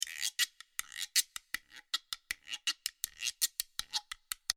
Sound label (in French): Guiro son; Percu